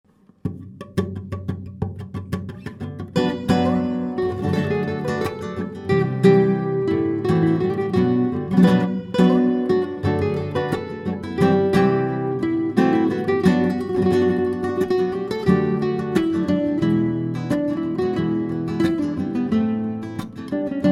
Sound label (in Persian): Gitar